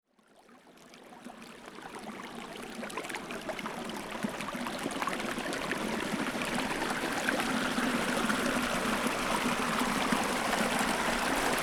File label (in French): Ruisseau Remontee